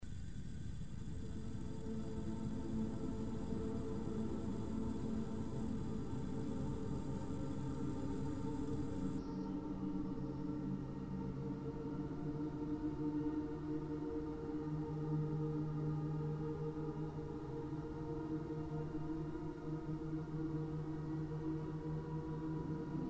Gasflame; realistic atmo; realistic atmo pack

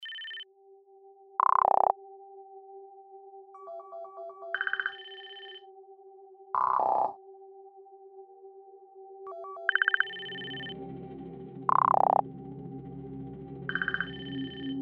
Interface Sounds, machine sound fx

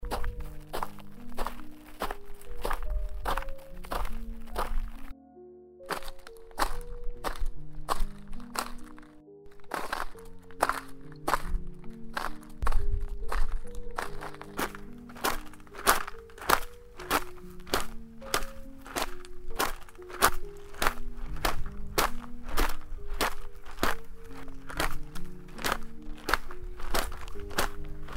Human Footsteps; human sfx; human sfx pack

